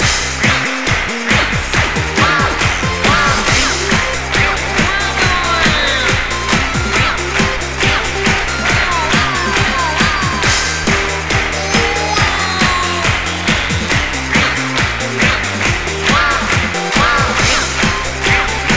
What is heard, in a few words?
Clapping and Frontrunning; WiiU; Electrodrome